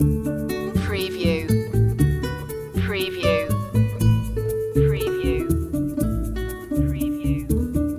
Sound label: spain guitars loop